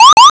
warning

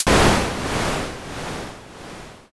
thunder

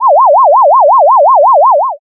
ufo

